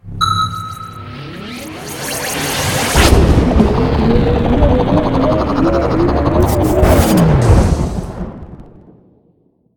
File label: warp; tetrio